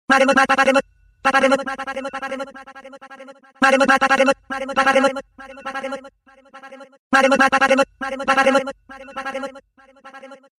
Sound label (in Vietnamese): bao thuc; ma gaming